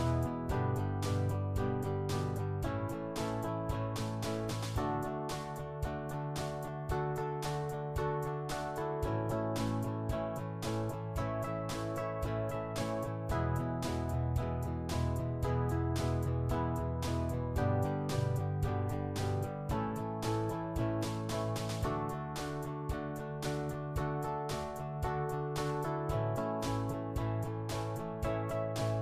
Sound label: Country Beat